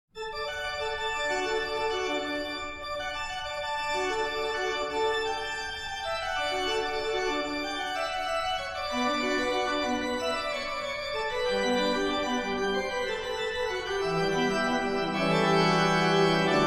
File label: Prelude and Fugue in D Major, Prelude; BuxWV 139